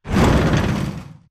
bullet explosive titan shudder; Titanfall 2

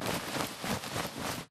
spectre chest punch grunt; Titanfall 2

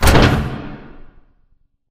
pod doors open start; Titanfall 2

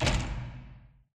radar tower start clunk; Titanfall 2

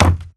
Mvmt FS Jog Wood Layer; AI Stalker; Titanfall 2

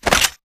ammobox ammorefill; coop; Titanfall 2

